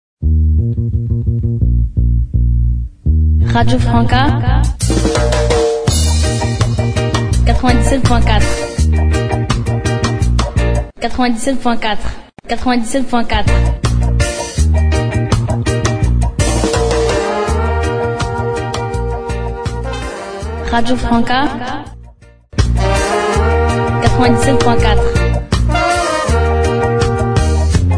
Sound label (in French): jingle radio; francas 974